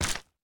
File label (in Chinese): 树脂破坏声